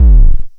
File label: BASS, Dual Percussion Synthesiser; Klone